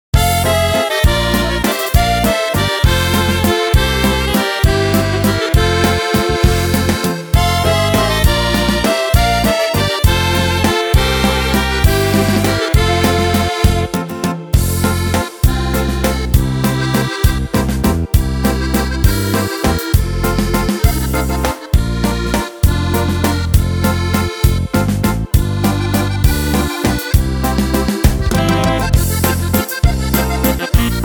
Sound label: Folk Waltz